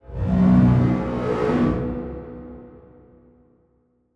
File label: Title Transition Communication Distortion; Production Element Title Transition